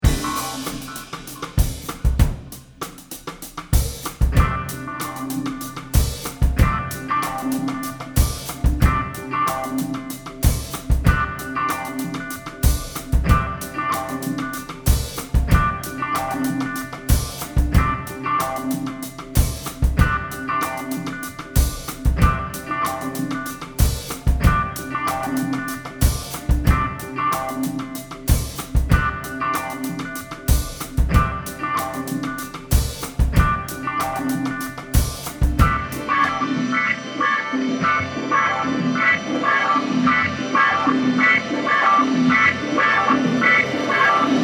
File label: bird